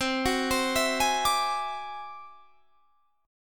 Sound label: D 9th Flat 5th C, guitar